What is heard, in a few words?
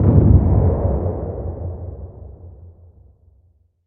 Cannon; Death